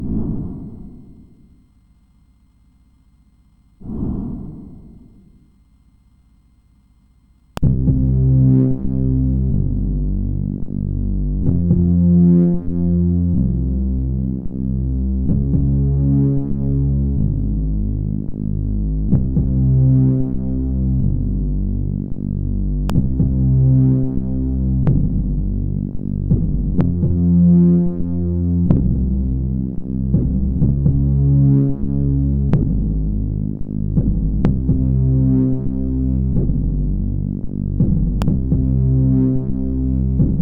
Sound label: MOS6581; sid